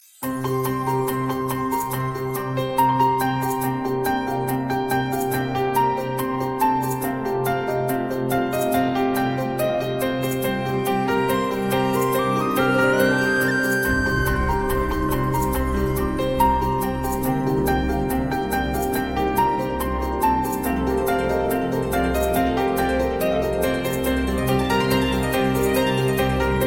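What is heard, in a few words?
instrument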